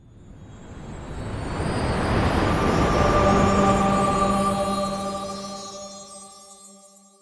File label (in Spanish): magia; alquimistas